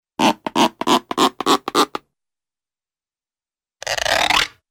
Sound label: Stretch-like Jacking-up Ratchet - Wooden Ratchet-like, cartoon; Hollywood Edge; Cartoon Trax